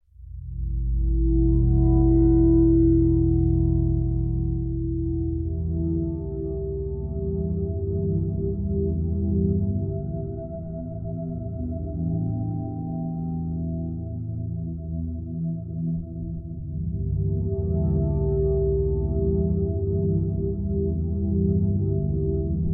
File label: swell pad, ambient; factorio; Factorio 0.14.21